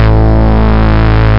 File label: SUB BASS; Groovy Bytes: Behind the Moon; BAS PERC